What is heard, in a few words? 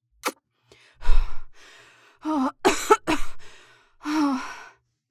suck